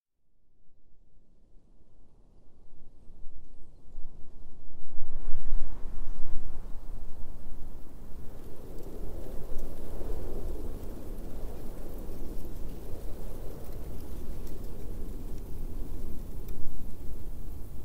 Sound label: winds of nature stereo edition sound fx; boom library